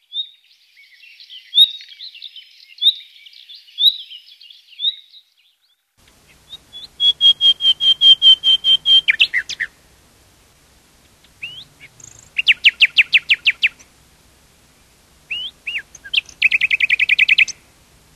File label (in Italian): usignolo Luscinia megarhynchos; Versi Animali; Il Forum della Fotografia Naturalistica